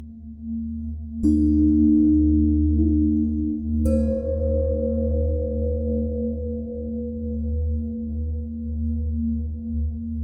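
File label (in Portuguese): Ondas Hipnóticas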